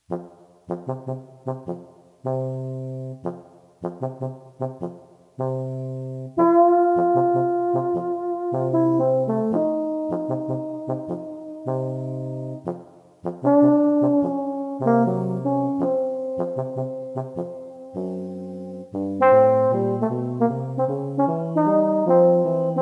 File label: Two Trombones, Jazz Bone Duets